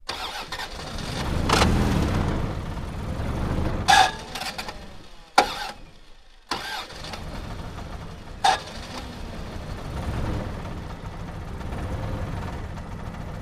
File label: Car; Starts With Bad Starter Burns, Labored Idles With Very Heavy Rattles, Off, Rear Perspective